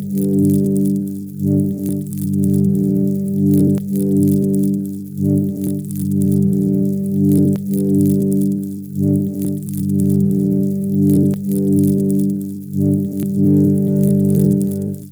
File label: Bpm127 G Squirming Layer FX